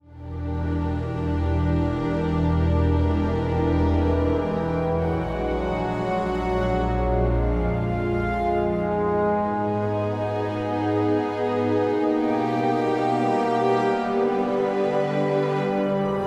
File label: Music Pause Track; Inversion